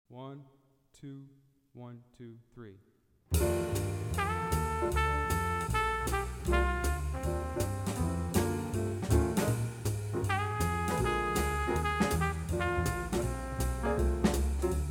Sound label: Groove Blues trumpet